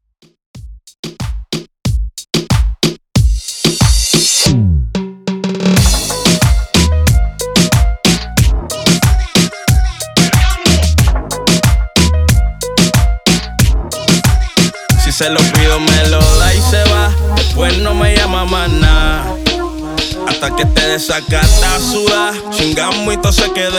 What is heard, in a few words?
Remix Intro 92 Bpm